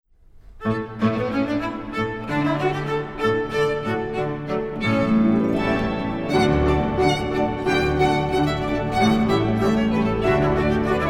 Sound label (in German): feuerfest polka